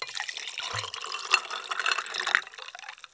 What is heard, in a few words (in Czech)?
nalevani s ledem; Mafie 1